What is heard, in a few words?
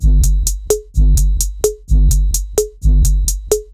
perc loop, 808, 128bpm; GJaxx; indertow